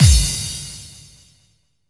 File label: BD-FX, Dance Mega Drums; Best Service